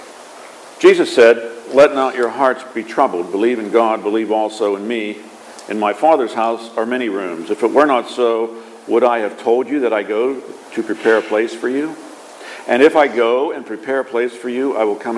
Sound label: Gospel